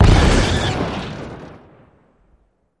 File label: Explosion Metal Deb, metal & glass